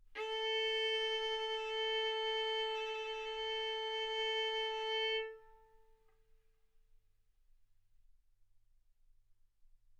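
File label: Violin arco mf A string A4 B4 stereo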